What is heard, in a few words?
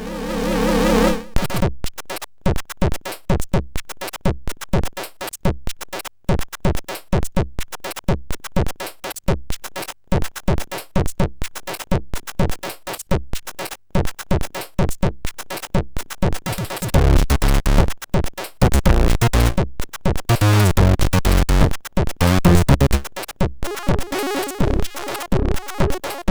Fat Beats